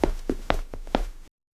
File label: single human footstep, boots; sound effect